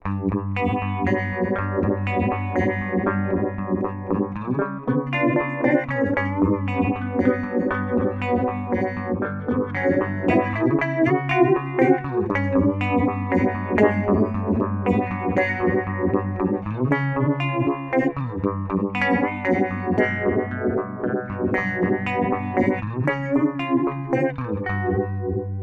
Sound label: Electro-Harmonix Mod 11 Chorus